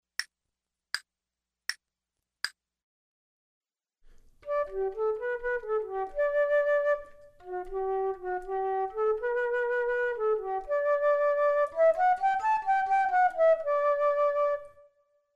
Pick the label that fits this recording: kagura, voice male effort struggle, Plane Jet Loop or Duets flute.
Duets flute